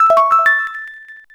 Toad House Items Collected; Wii

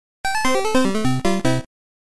game over; Super Mario Bros. 2

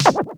Fire Ball Hit Mega Goomba

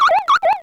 Giant Para Beetle; New Super Mario Bros. Wii

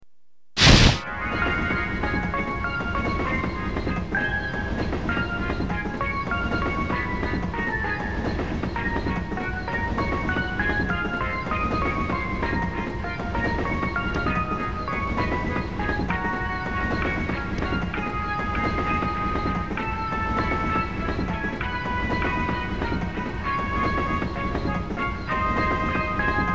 night train; Paper Mario 2 The Thousand Year Door

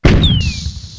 Bowser Jr Shooting Bomb

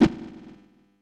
Strong Whoof; Super Smash Bros

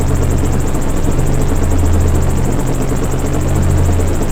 Doom Ship; New Super Mario Bros. Wii

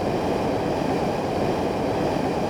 Flame Thrower; New Super Mario Bros. Wii

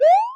Jump; New Super Mario Bros. Wii

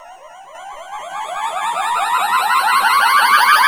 Bobomb Fuse; New Super Mario Bros. Wii

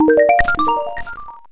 Multiplayer Team Finish; New Super Mario Bros. Wii

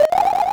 Spin Jump

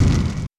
Firey Blaze; Super Smash Bros

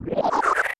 Beam Up; Samus; Super Smash Bros

